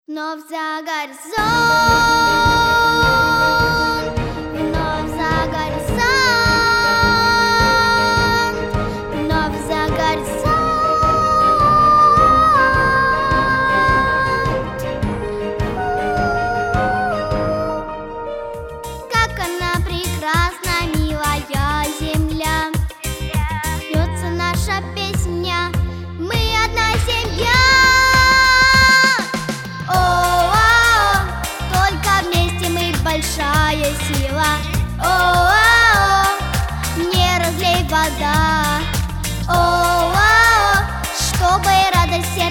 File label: voc Rus